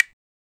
crisp wooden block click

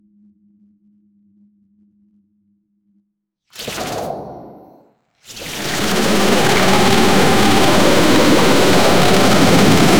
the sound of hollow infin, reversal

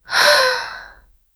realistic brea, misty

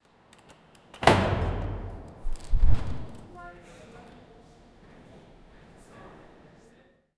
entering a building

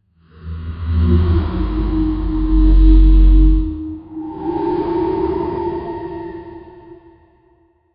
dragons roar dark fantasy

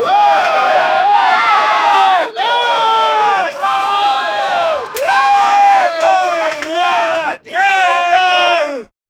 people shouting chor chor, yelling

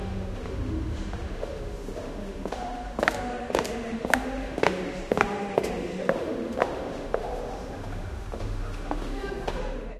hospital walk

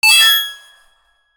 collectibles sound to col, collectible

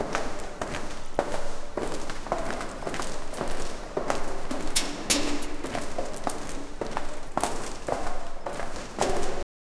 heavy boot steps loop; prison

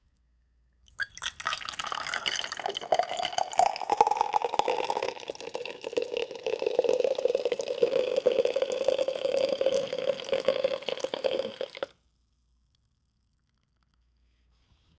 ambient where a gam; headphones

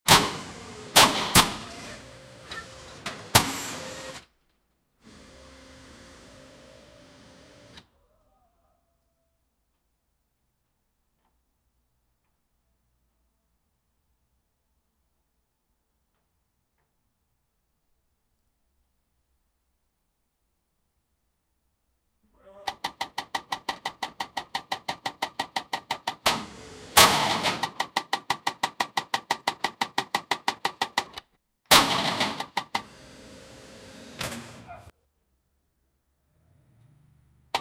car mechanics car repair; SFX Engine

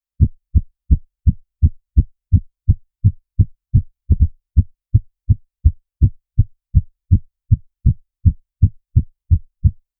heartbeat spike

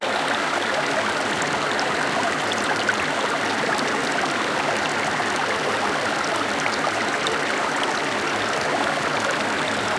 a calm and soothing river, creek

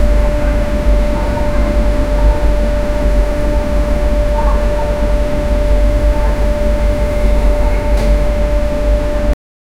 factory sounds

